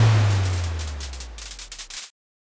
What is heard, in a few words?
firework burst